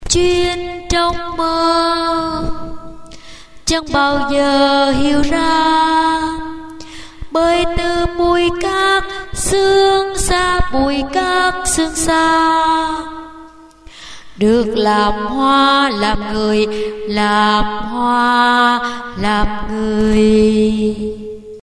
alto

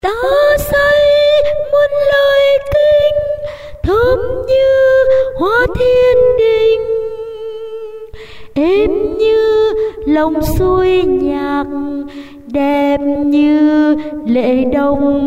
tenor

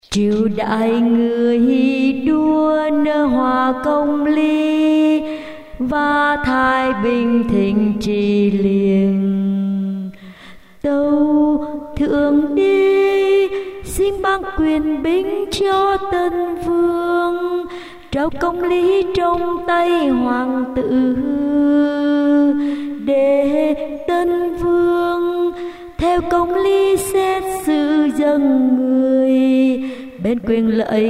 alto basso

